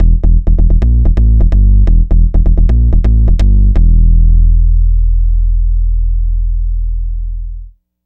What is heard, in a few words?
Jump Bass; Dr. Fink's Funk Factory